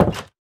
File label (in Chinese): 木门关闭声